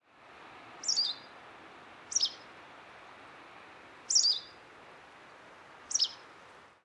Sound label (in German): Bachstelze Motacilla alba, vogelstimmen